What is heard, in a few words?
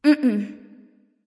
nv disagree; smite; awilix